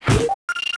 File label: demo Charge click